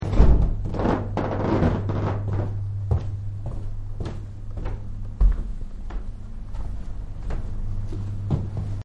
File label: Footsteps Heavy Squeeky; Human; Partners In Rhyme